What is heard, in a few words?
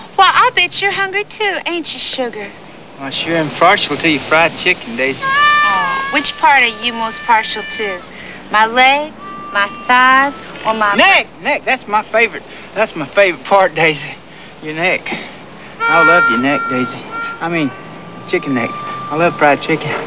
fried chicken; tv and movies